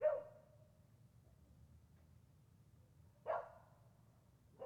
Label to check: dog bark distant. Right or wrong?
Right